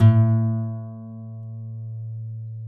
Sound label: ACBASS A3; Sonic Reality; American Heartland